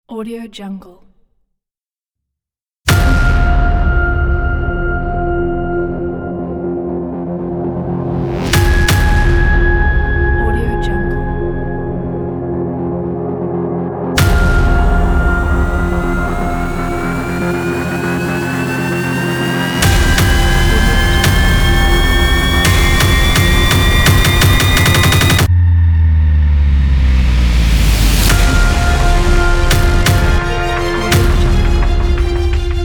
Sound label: dynamic hybrid action trailer